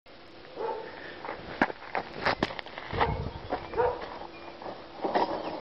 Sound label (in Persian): Effect Sedaye Pa